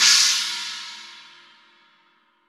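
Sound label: cymbal china, Roland; Club-50 - Foundations Roland; Ext.Snare 3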